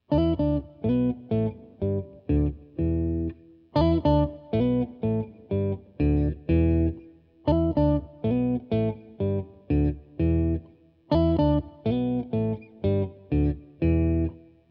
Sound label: Pedal PQ 1 octave